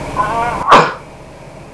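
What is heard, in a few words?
sneezing; People; Sound Library 2000; Wayzata Technology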